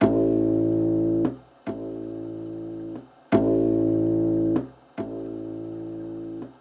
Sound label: 142 Am lofi bass